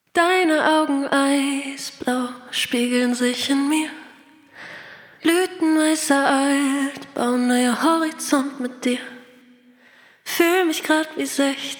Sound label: Vocal Reverb Tweak